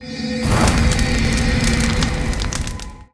Portal; MUDevs S20.1-3

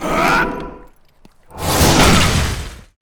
attack; Deasuler

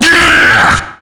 Iron Knight attack

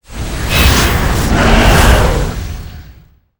Whirl Wind; Lord Silvester; MUDevs S20.1-3

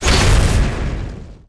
Shot Fire; MUDevs S20.1-3

